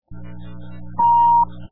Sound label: answering with short beep; sydney; test exchange